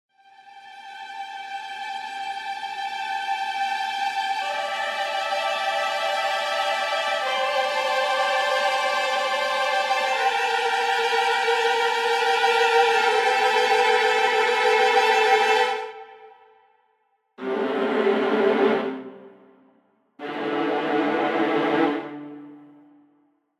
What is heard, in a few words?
String Ensemble